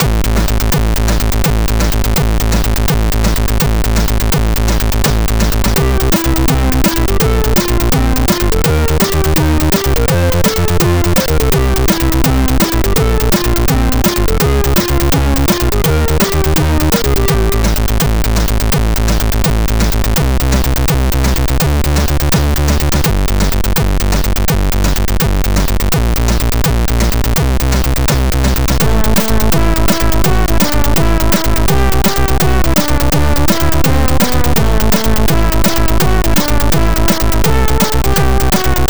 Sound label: c64; turrican; going up